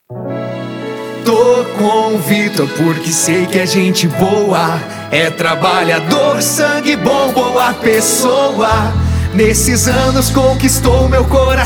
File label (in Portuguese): Jingle Salmo DEP ESTADUAL; Victor Brum